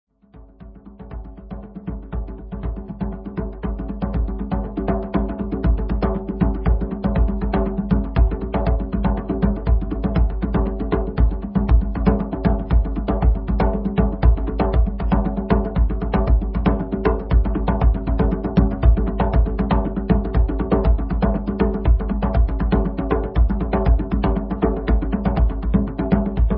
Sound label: TRANCE DANCE, Dancing Drums